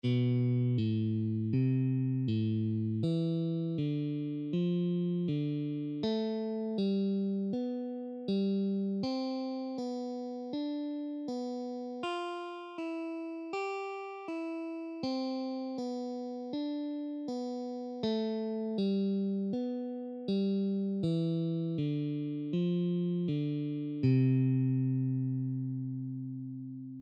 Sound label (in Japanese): ハンマリング プリング トレーニング; pulling beginner control exercises